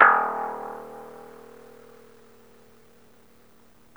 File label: D#1 BASS; Zero-G; Chemical Beats; BASSES